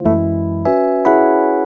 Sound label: CHORD, 150 BPM; Ueberschall; Drum & Bass Flash Trackz